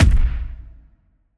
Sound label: Skill hit; Moine